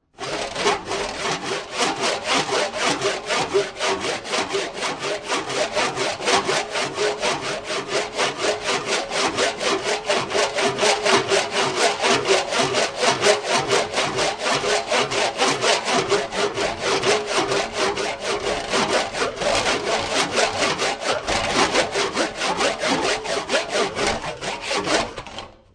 Saw Hand Saw Sawing Wood Fast, Industry, Saw, Hand Saw Sawing Through Wood, Fast